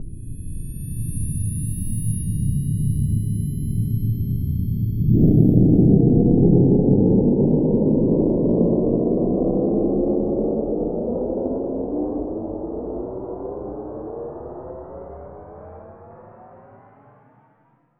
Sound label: Jetplane; Roland BK-5